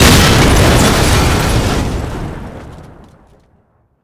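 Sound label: flak 88 explode; Explosions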